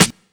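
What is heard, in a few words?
snare drum, Burning Grunge Hip Hop; USB Soundscan vol.34; AKAI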